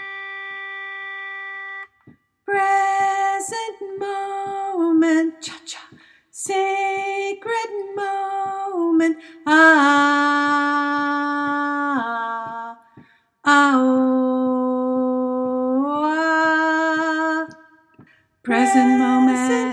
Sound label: all parts, choir; The Freedom Choir